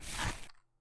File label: Sand walking Step